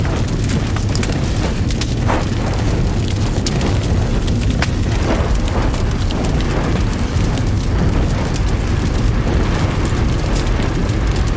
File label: ambient blaze near